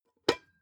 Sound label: pot lid open plop; objekte; topf